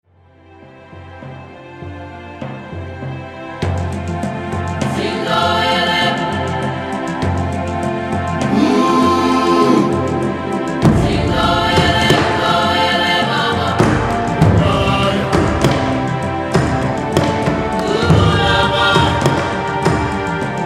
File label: African processional